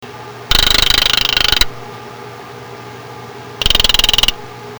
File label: D lamas, chants